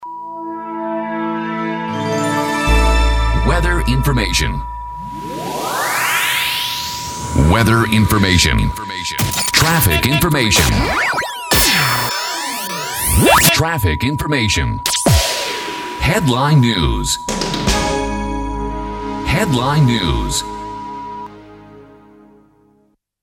jingle

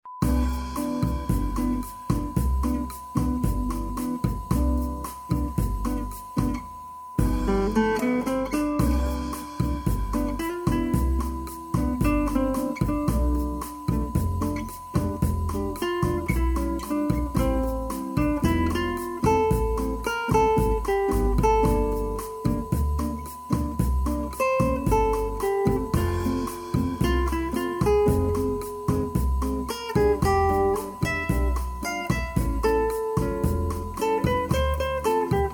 music on guitar

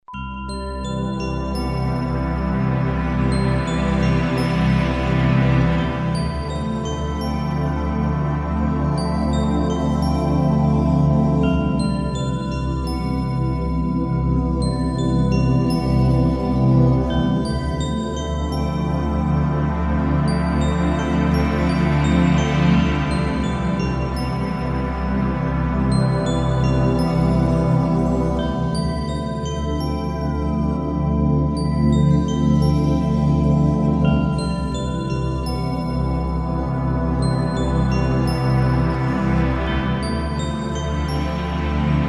MUSIC BOX

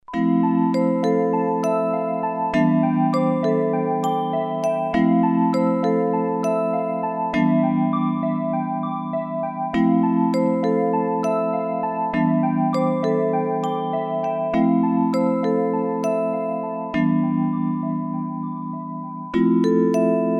MUSIC BOX

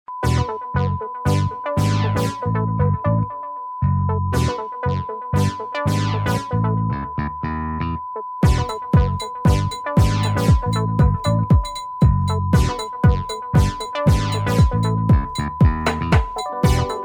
smooth melody

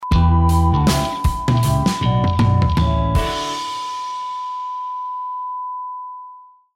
Jingle

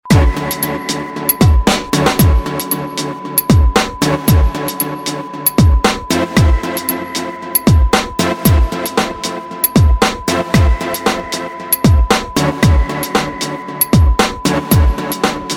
air groove

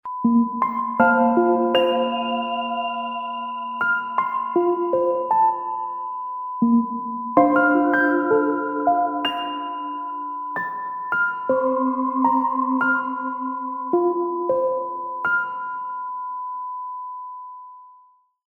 air groove

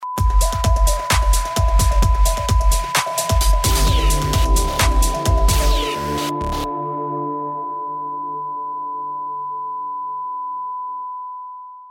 Jingle

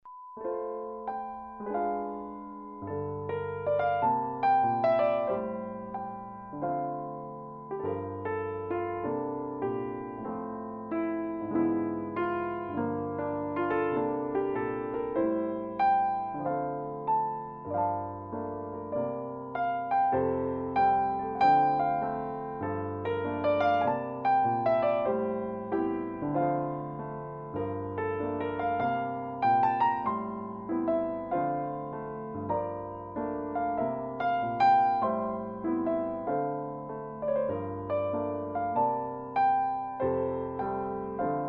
artless piano

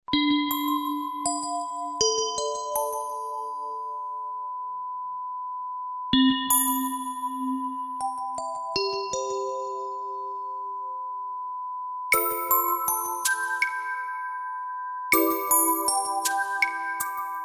MUSIC BOX

